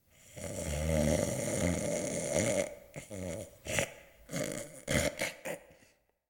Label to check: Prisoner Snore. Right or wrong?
Right